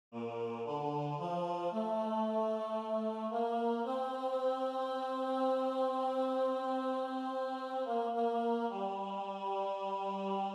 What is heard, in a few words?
I Believe Baritone solo